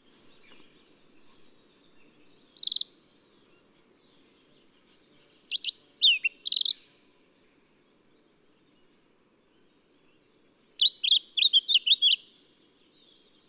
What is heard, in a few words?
Microeca Flavigaster; Lemon-Bellied Flycatcher; Call 2